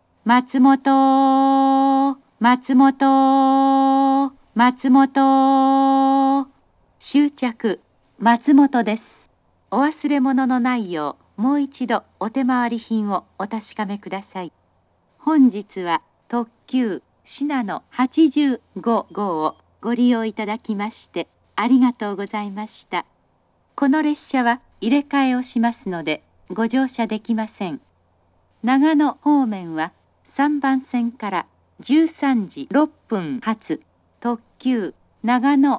matsumoto stop limited express shinano, station; jr-east

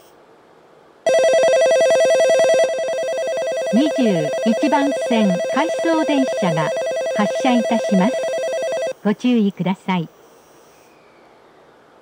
shinagawa not in service; station; jr-central

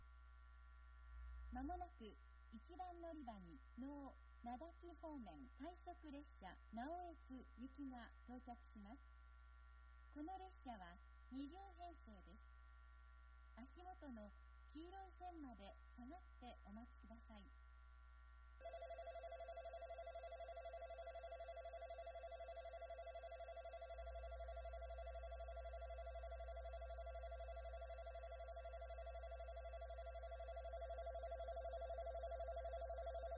rapid naoetsu, itoigawa station; echigo-tokimeki-railway

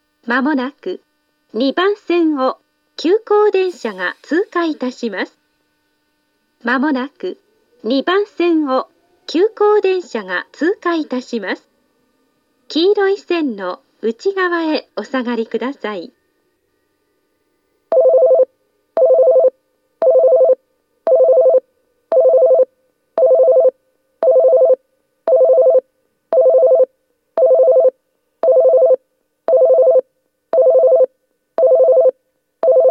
pass express, station; tsukimino

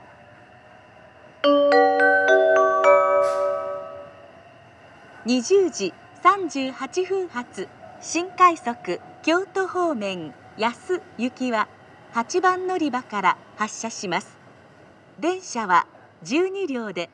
special rapid service, jr-west amagasaki station; yasu

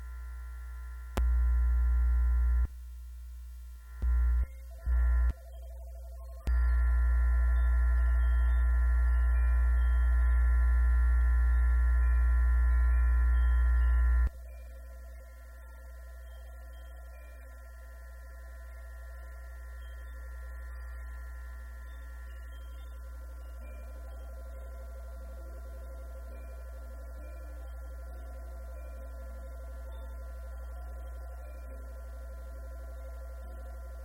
rapid naoetsu, itoigawa station; echigo-tokimeki-railway

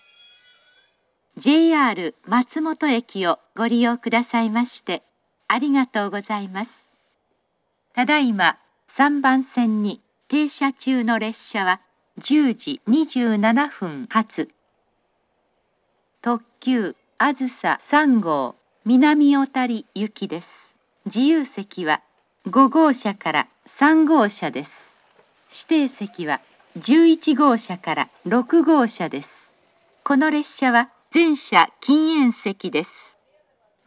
matsumoto limited express, station; minami-otari; jr-east; azusa3

